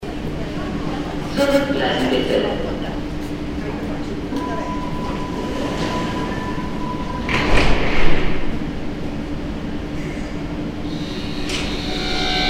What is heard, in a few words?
subway departure